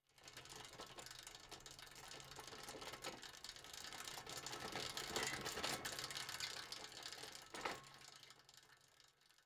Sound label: Bicycle Movements; Fairlight Sound Lib